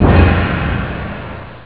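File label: hard hi-hat open; ST-XX Amiga Soundtracker Sample Packs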